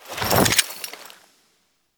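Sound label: UI select menu; Veilbreaker; Kahl Customization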